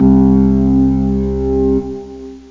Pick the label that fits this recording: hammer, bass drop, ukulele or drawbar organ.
drawbar organ